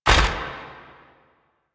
Melee Step; Warframe; Necramech

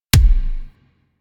Pistol Punch Delayed; weapons; Warframe; Thanotech

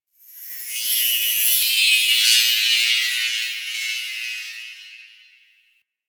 Energy Chatter; Props; Warframe; Ambience; Sentient Devourer

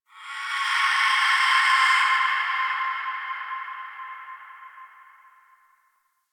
Ext Creature Distant Mid; Ambience; Misc Outdoor; Warframe; Infested Eris

